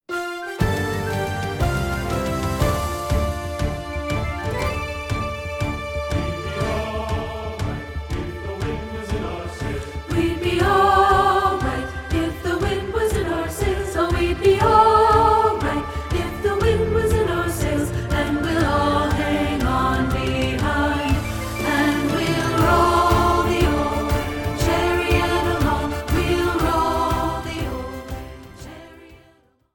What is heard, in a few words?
A Seafaring Suite SATB (Alto Dominant)